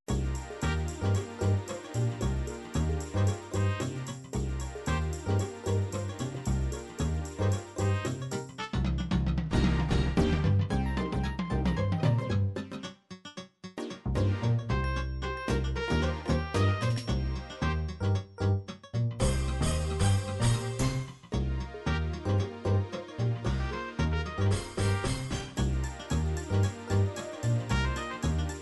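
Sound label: dance funk house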